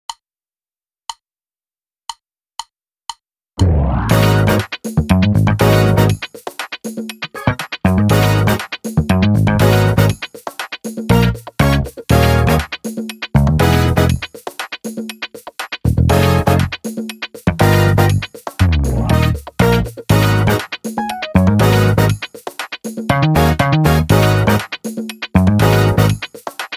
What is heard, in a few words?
Gospel Groove Loop drumless